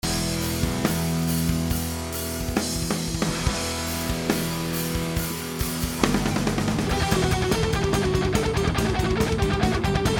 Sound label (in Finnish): punkband soolodemo